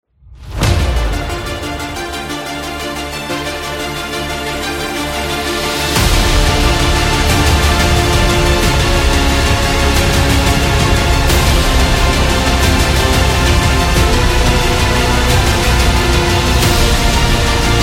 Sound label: Win